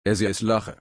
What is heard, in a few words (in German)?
lachen